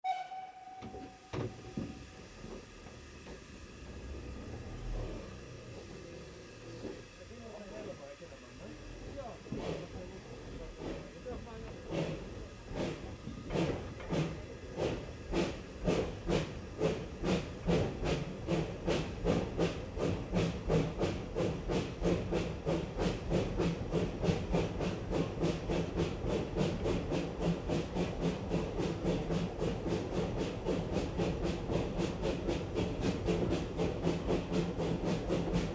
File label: old train cabin inside